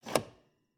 Cabin, outer doors, double doors, tension, latches, wooden, screens, slide bolt, hyper; Eiravaein Works; Vaeyan IV